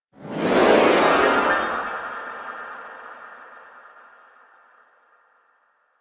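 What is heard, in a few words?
Grand Piano Cacophony; keyboards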